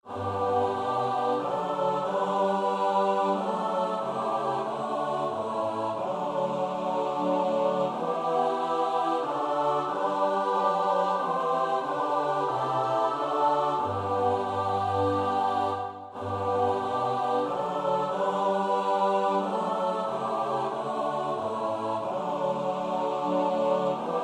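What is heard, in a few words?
soprano alto tenor bass; Bach (Johann Sebastiaan); BWV 070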